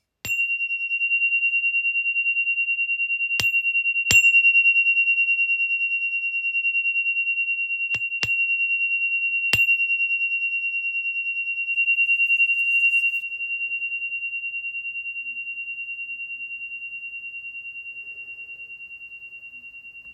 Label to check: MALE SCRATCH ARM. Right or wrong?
Wrong